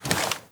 weapon movement sprint; weapons

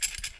spas sling; weapons

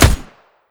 smg1 fire; weapons

